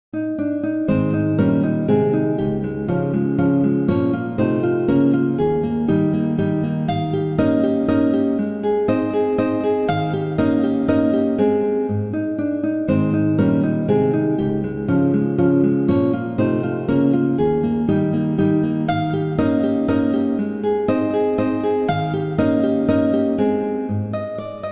Walzer

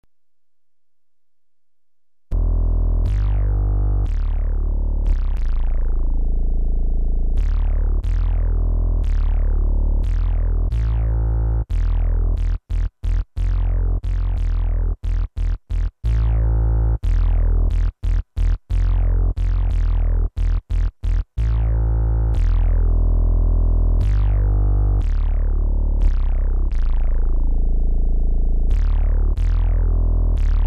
Elobass

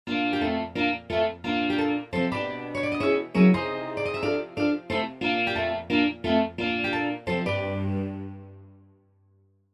Orchester Djingle; la brève orchestre djingle (0:10

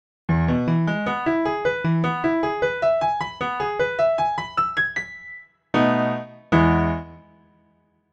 der musikalische Endpunkt

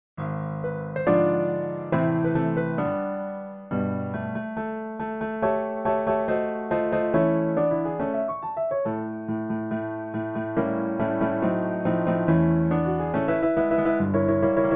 Klavierphrase; nach Schubert

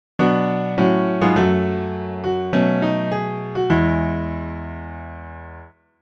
der musikalische Punkt; musicien point A posée à la piano itou

